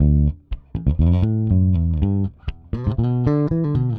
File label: Pop, Bass